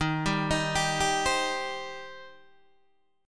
D sharp 6th, D sharp, down, Guitar, Open D

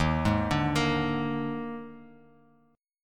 Eb Major 7th Suspended 4th Eb, Bass Standard; arpeggiated; 11,11,12,15; EbM7sus4

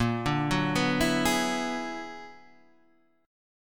B-Minor Major 13th

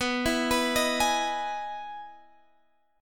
E Major 7th B, chord

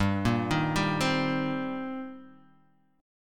Eb 6th G

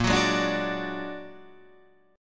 A sharp Augmented 7th A sharp, guitar

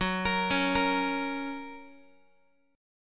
Gb Major Gb down Guitar; Listen to Gb arpeggiated

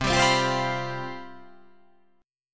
C Major 7th Suspended 4th, down

